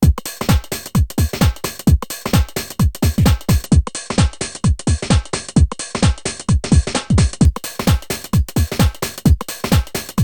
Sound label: drum